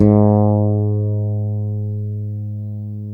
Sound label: FRETLESS, G#